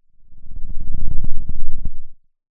Sound of a lion’s roar

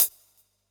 Elec Cl Hat, drum

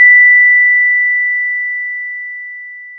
pop, Sound of a doorbell

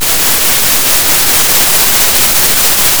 Sound of a thunderstorm

